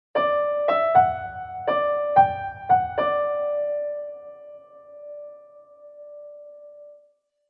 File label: jingle